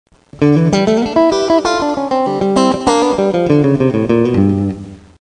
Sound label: strat